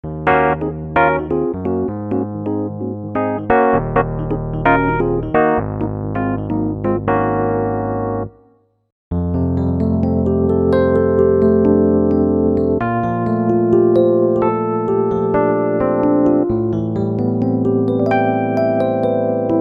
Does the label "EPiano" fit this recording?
Yes